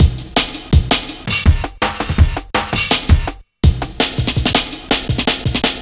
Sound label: Combination A, programmed loops; Zero-G Jungle Warfare 1, 2 & 3